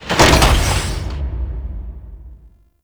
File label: Big Door Close; The New War; Ambience; Narmer Fortuna; Venus